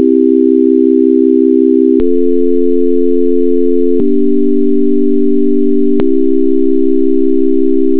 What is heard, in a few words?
chord